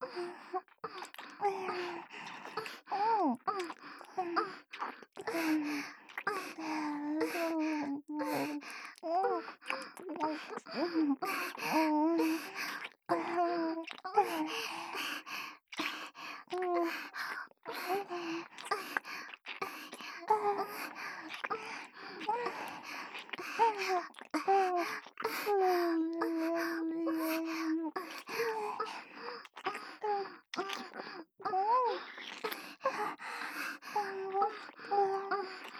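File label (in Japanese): 演技喘ぎ＋ディープキス; 美冬; 小春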